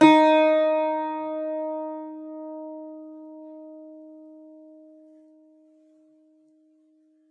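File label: bouzouki D#4, bouzouki big; SoundBytes; Early Patches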